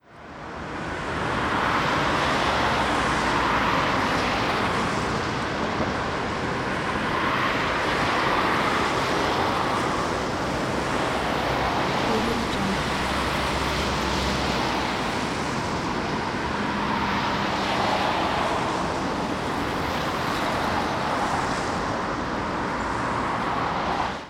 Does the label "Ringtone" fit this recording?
No